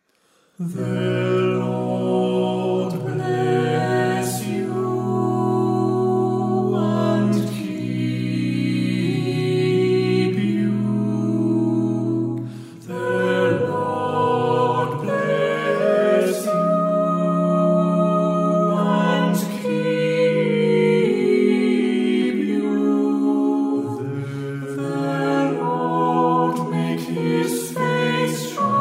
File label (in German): weniger Hall; Aaronic Blessing